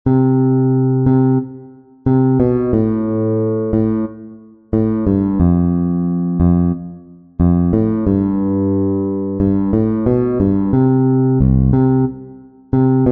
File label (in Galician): BAIXO ELECTRICO; Audio do baixo